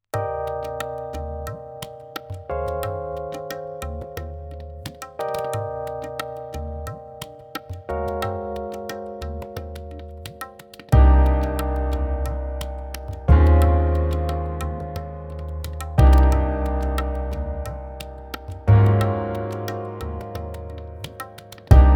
chill rain, chillhop guitar

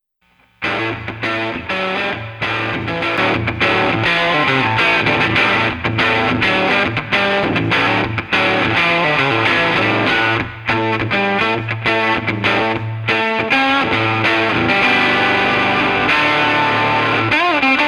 guitar

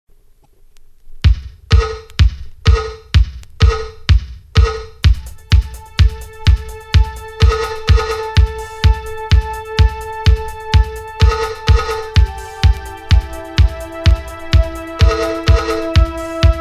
extended disco version

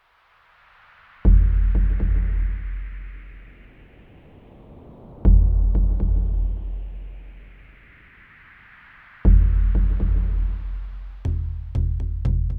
drums

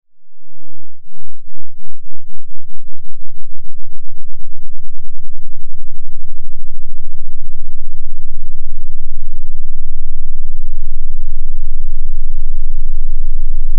sweeps from 1hz to 60hz

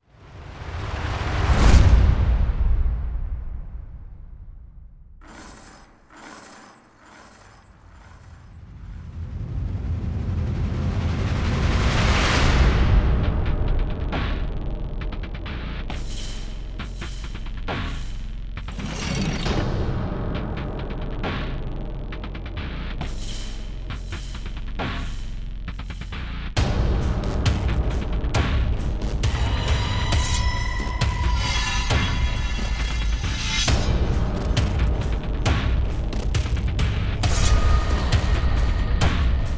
percussion only

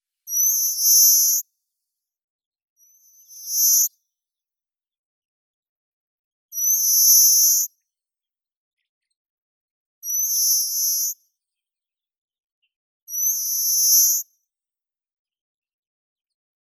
vorobei korotkopalii carpospiza brachydactyla

